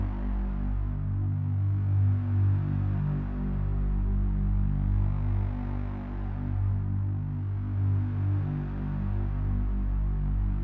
Gameplay Gameover Loop; No Stick Shooter; Android

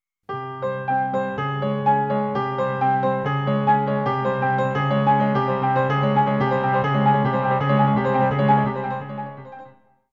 Switch BGM; Tanoshii Beyer Heiyou Super Mario World complete music collection 1993

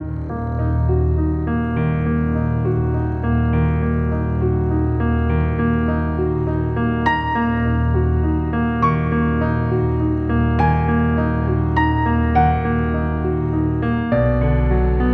Music End Forgotten

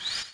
Space Restore Up

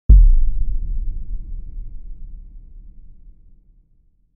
deep thudd

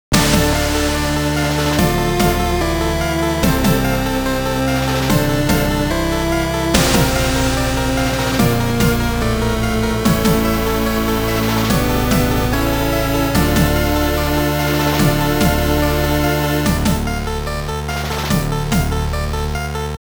Rumbling Hills 8bit Version; Blaster Master Zero 3

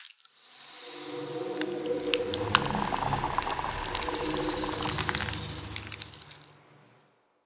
Acid Worm Small Grow; we are the dwarves gamerip 2016

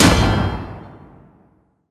Goblin cannon Drop

